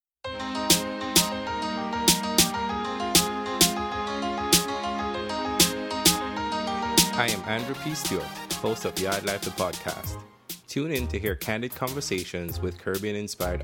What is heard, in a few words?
Drop